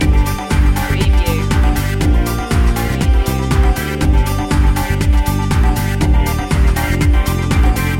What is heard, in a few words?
oriental fantasy loop